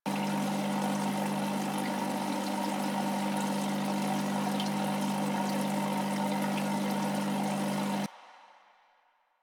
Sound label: Cave Bedroom Hospital Device Loop; Ambience; Warframe; Stalker Cave